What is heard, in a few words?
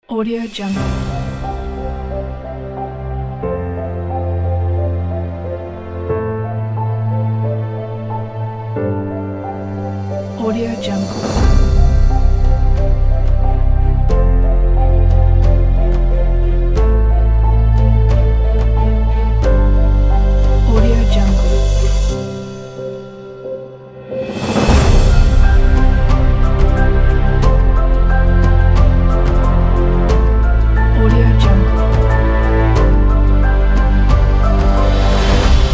documentary; mr-audio